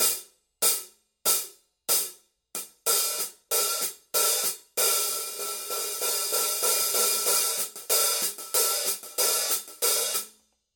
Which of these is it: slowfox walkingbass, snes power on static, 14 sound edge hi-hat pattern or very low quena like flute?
14 sound edge hi-hat pattern